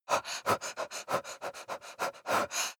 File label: breath female pant